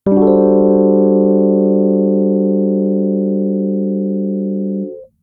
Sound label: Maj7sus